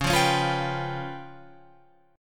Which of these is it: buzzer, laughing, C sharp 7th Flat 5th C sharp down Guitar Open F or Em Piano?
C sharp 7th Flat 5th C sharp down Guitar Open F